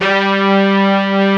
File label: BRASS SECT G2; AKAI S-Series CD-ROM Sound Library VOL-2; TP+SAX2